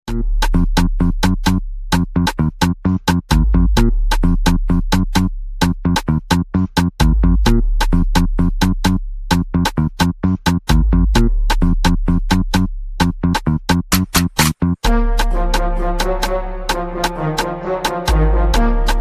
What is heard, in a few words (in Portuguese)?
base de funk ringtone